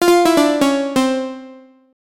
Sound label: piano